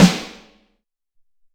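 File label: SR16 Snare, Alesis SR-18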